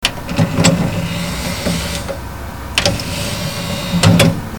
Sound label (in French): Ouverture fermeture lecteur cd; bruitage; Electro-menager